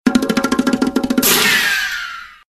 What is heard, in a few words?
Bongo Shot